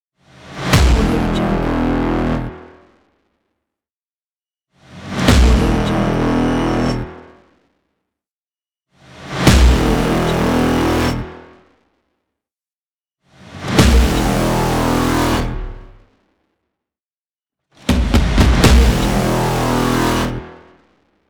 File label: epic trailer braams